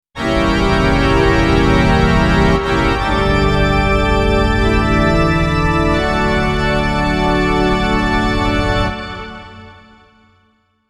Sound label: creepy church organ sound effect